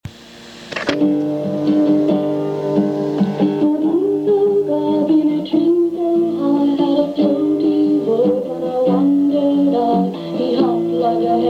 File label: bullfrog song